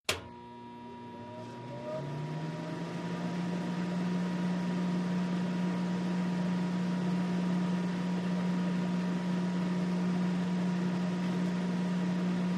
Welding, electric arc, switch on, attach electrode, weld with crackle and buzz